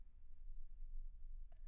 Children Choir; Studio Bekol Children Choir

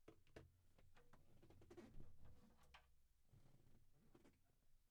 Drums

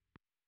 Bass Sansamp

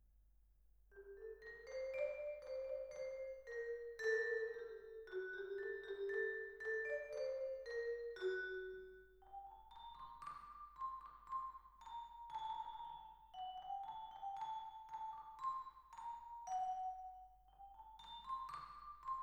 Vibra

